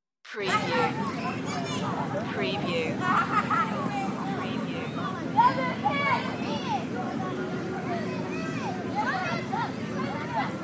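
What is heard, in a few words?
Children Playing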